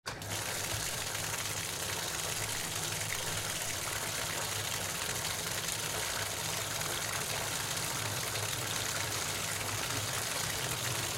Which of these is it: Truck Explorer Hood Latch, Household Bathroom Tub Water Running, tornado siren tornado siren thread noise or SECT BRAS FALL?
Household Bathroom Tub Water Running